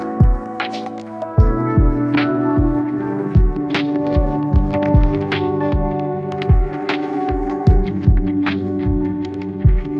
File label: lofi slow bpm electr; musicgen